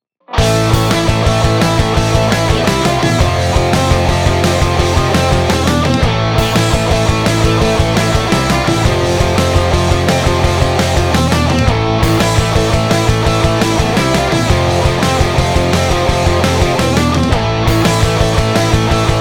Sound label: Pop Punk Test